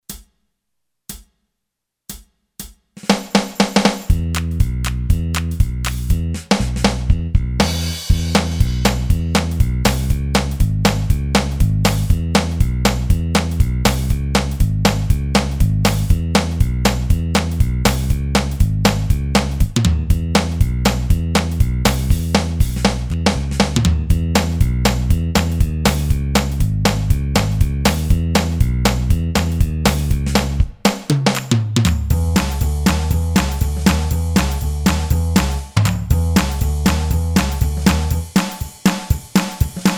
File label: GOSPEL, Boss DR-3